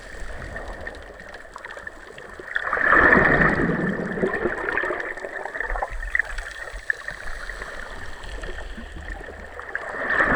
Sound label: hydrophone excerpt; hydrophones